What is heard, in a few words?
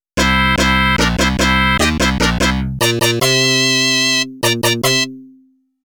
GAMESET - WIN; Taito Digital Sound Archives Arcade Vol.2